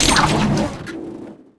suspension cables break

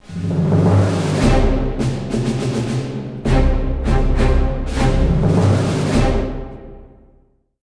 tension, music

